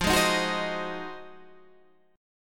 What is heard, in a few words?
F Minor 7th Flat 9th